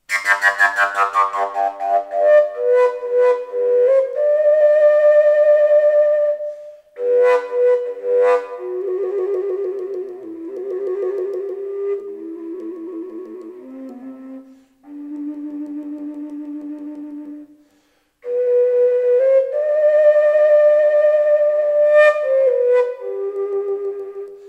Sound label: fujara g